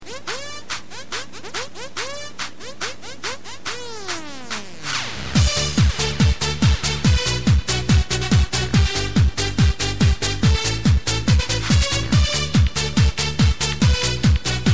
Salsa

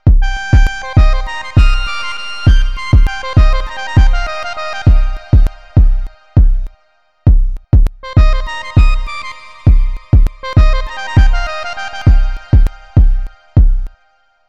Beats

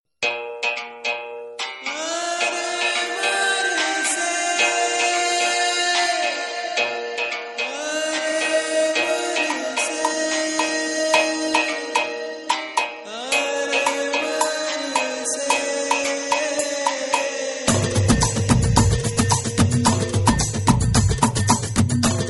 Desi Music

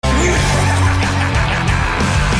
evil laugh

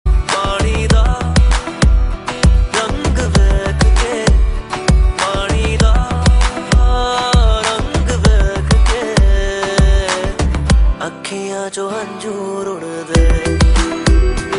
beat

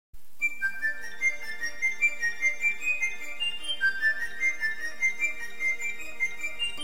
Whistle; Gupt

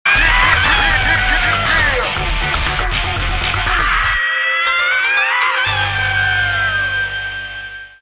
Nokia N96

